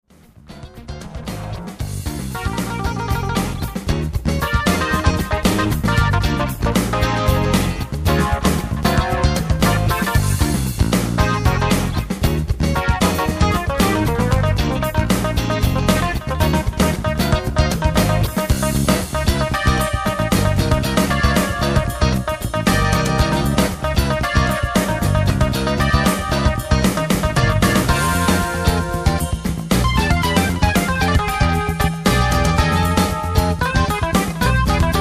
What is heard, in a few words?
solo, jazz